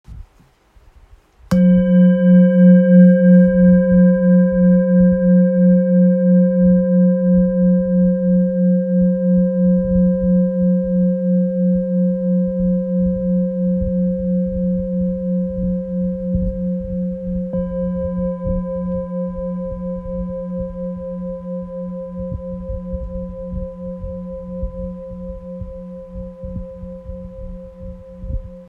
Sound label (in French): Bol bengale fa